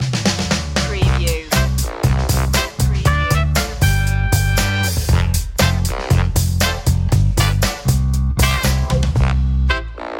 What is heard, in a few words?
fresh groove stinger